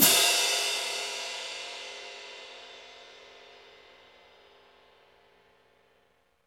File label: CYM ORCH, Orch Crash; Roland LCDP03 Orchestral Perc; Orch Cymbals